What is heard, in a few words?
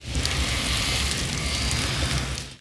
breathe, mob blaze